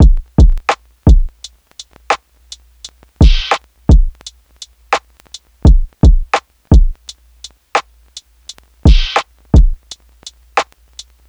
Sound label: Full Drum Loop - 170 BPM; Lofi; Timeless; Cymatics; Lofi Starter Pack